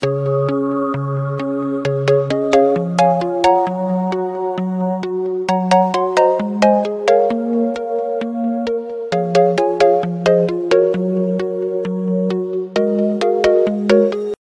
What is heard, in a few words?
electro ringtone